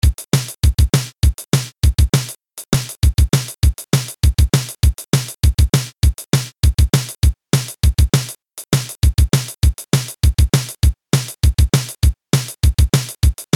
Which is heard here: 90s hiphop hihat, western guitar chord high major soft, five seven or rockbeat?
rockbeat